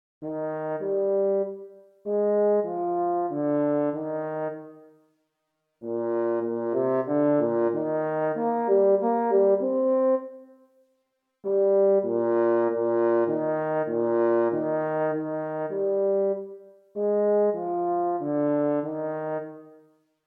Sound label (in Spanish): sonatina bemol mayor para duo trompas